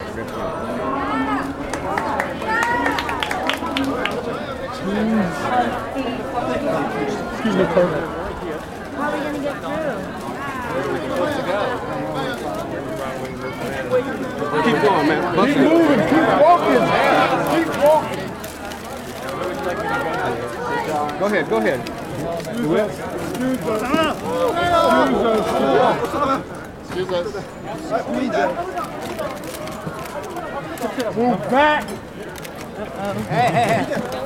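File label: Crowd Japanese Street, riot panic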